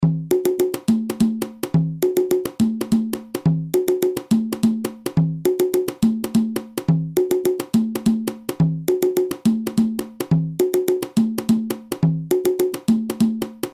guarapachangueo cajon

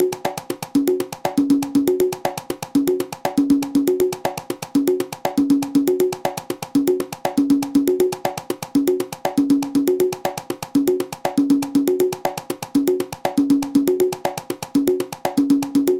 guaracha conga

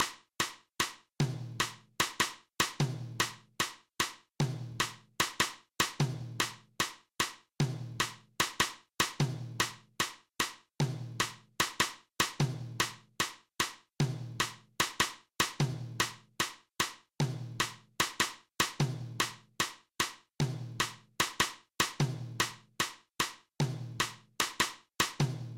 danzon timbales; Le Danzón vient d'une évolution de la Habanera et de la Contradanza en 2/4.

